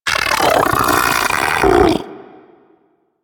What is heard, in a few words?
Arachnoid Vocal Patrol; Enemies; Vocals; Warframe; Corpus; Arachnoid Hunger